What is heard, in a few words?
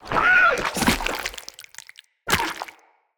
Stuck Tower Beaver; Encounters; Animal Rescue